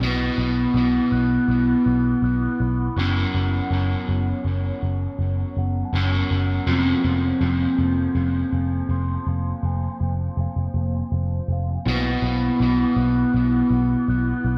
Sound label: Background Music; The Jolly Gang's Misadventures in Africa